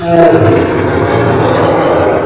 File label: ROAR